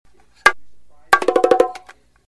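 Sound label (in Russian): бонго перезвон бодрый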